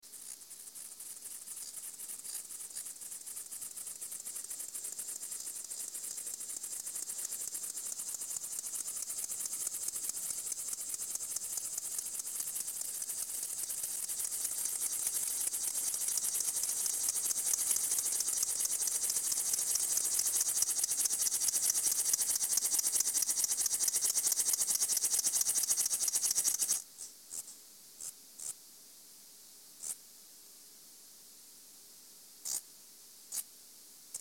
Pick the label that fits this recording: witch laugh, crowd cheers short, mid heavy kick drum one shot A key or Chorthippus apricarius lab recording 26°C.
Chorthippus apricarius lab recording 26°C